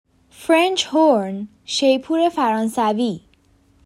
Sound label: French horn